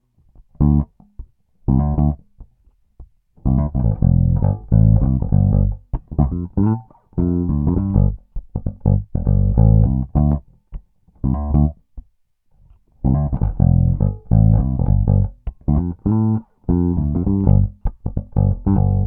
Humbucker Fingerstyle Tone DOWN; Peavey based pickup Shootout

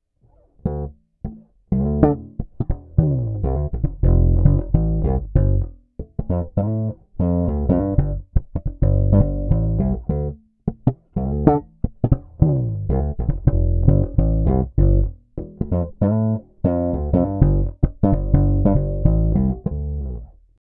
Humbucker Neck Slap Tone DOWN; peavey shootout audio samples

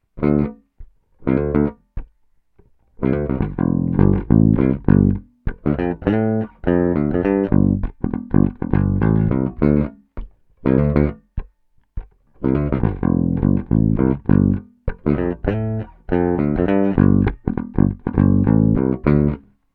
Humbucker Bridge Pick Tone UP; peavey shootout audio samples

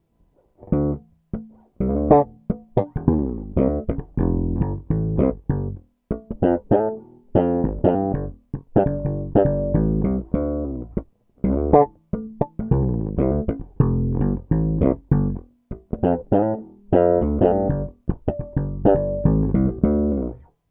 Bridge Slap Tone DOWN, peavey; peavey shootout audio samples

